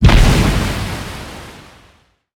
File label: damage hits explosions; World Of Warships